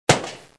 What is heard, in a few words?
pop